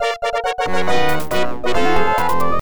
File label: Imperial Fighter Destroyed; star wars x wing; ibm pc at